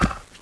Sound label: gonome step